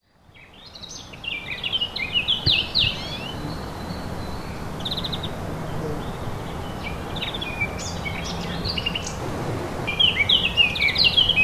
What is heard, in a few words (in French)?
Fauvette